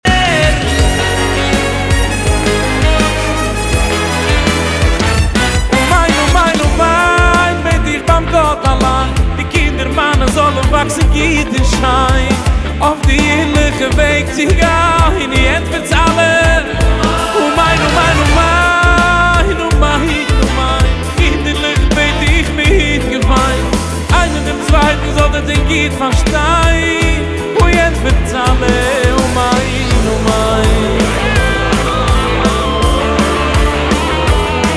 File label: amen amen